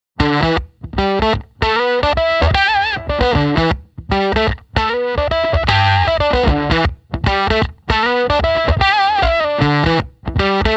Proctavia Less Distortion

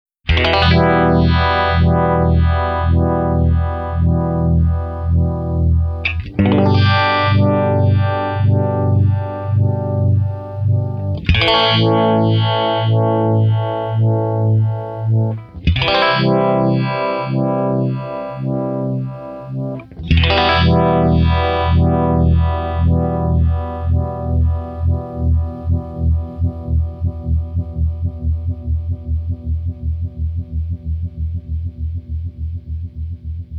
Micro Vibe Vintage

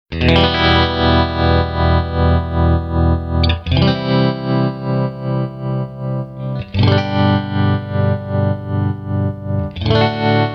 Tremolo Vintage

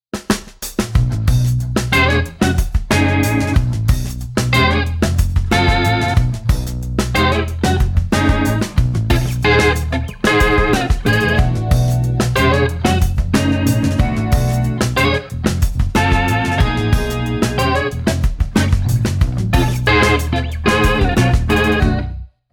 Spinning Speaker

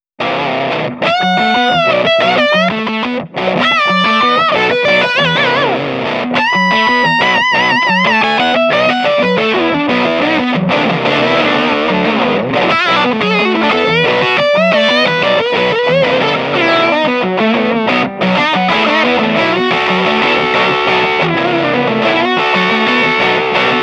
Super Fuzz Fuzz

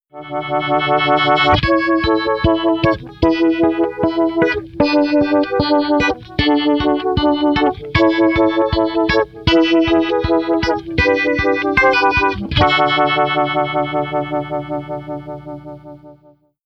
MicroVibe mellow

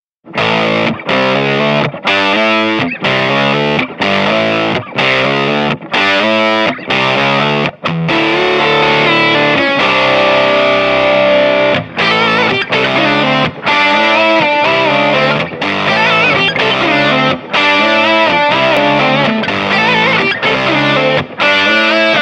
Super Fuzz; TV Theme

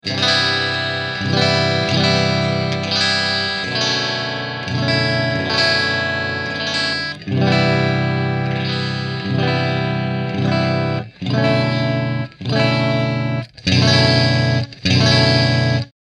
Loaded Signal Strum

